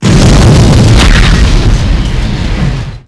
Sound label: Large Exp, Explosions